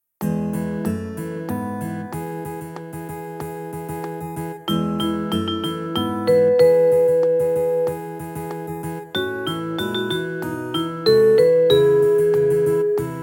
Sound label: Strings